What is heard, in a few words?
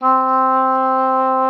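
C4, oboe d'amore; Xsample VOL-7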